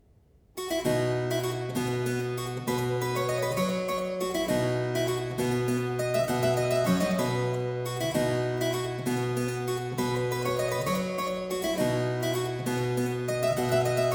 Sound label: Trumpet minuet, harpsichord; Complete Chamber Music; Brilliant Classics; Miscellaneous pieces for the harpsichord